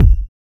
kick one shot G sharp key

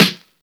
smooth snare sample f key; snare drum samples

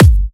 acoustic bass drum g key

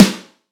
snare single hit g key; snare drum samples; single hits one shots

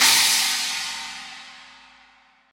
crash cymbal sound clip c key

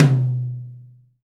low mid tom drum sound b key

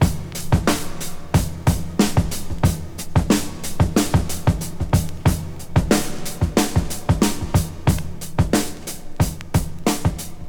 92 bpm breakbeat f key

